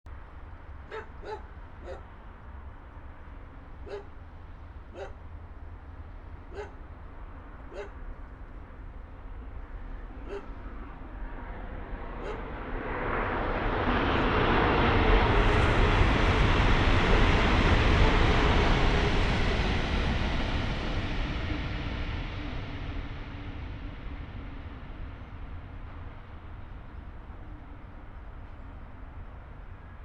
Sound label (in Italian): Treno; Eigenmike; ComunePR; NuovaStradaF